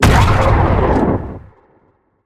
laser Gun Shot; Weapons

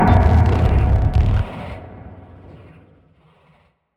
take damage; Watcher

